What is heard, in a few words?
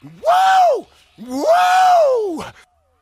wooow wooow; David Lee Roth